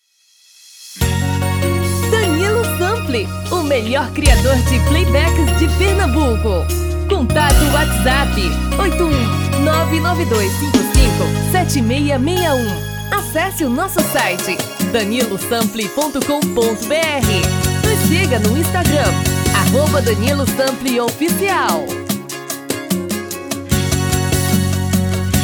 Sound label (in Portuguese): BREGA